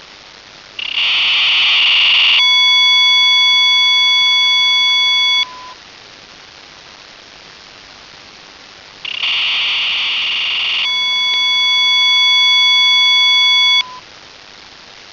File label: f51.242 mhz bw6 khz bpsk 1200 bd t-56